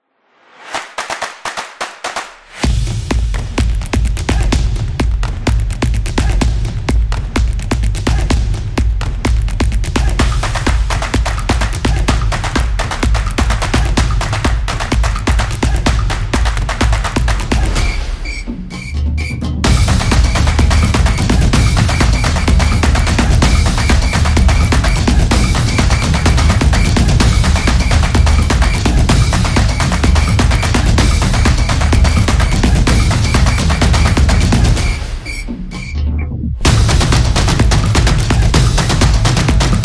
Clap Upbeat

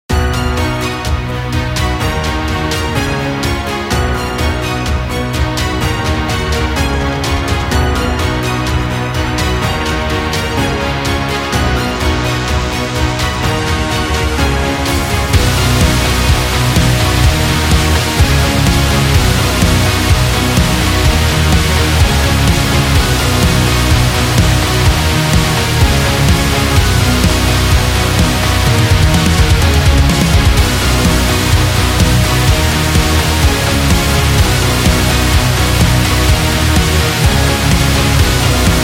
Symphonic Metal

